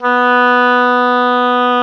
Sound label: OBOE, mf f; Roland L-CDX-03 Disk 1; Oboe 2&3